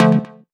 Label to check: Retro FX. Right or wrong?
Wrong